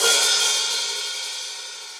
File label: Hihat Open Shank Reso Classic; Drum Hits; Standard Hits; Ableton; Factory Packs; Session Drums Club